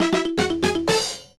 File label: Menu Jingle; Personal Trainer Walking; nds